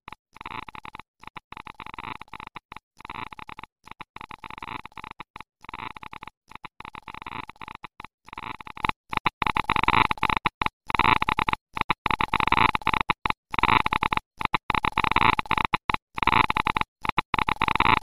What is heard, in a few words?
geiger counter; hl2